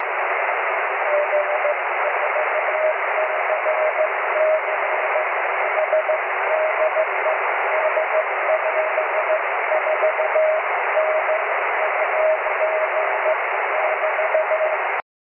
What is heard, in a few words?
cw; 23cm